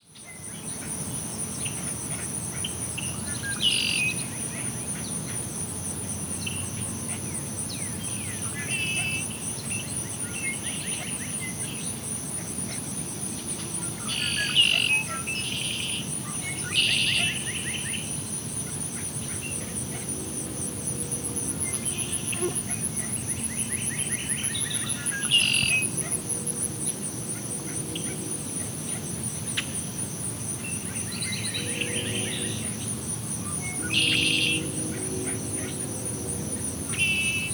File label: Savannah Wildlife Refuge, Ambiences; Savannah, GA; TS Sound